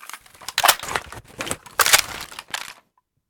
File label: vintorez reload, weapons